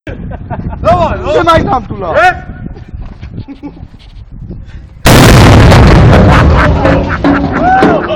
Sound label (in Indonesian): bin canon full